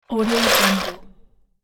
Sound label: toy plastic building bricks